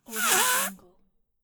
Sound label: funny rubber balloon deflate; stormwaveaudio